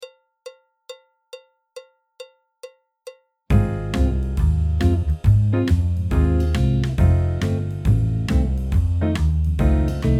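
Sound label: backing track, Jazz; alto, piano; Jazz Syllabus Level 3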